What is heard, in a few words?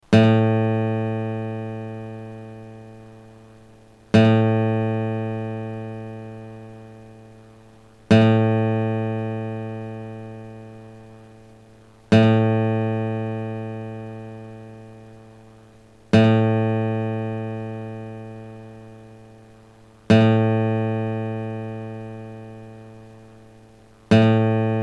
A 5th string Guitar